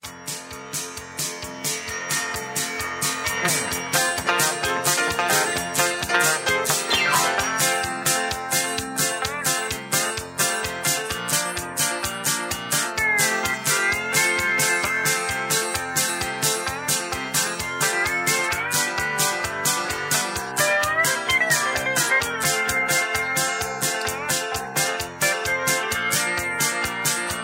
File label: Patter